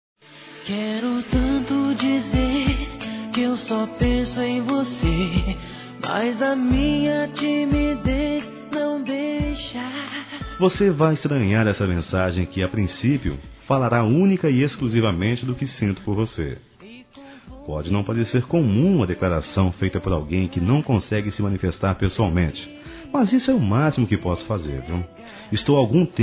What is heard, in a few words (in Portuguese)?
ADMIRADOR SECRETO, Conquistas Cantadas, masc; Telemensagens 2017